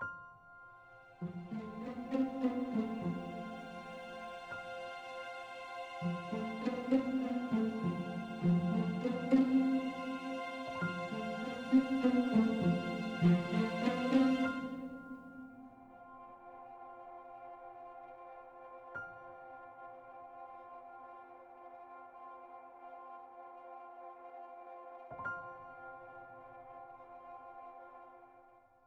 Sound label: Quest Melody Loop; bakugan champions of vestroia 2020; switch gamerip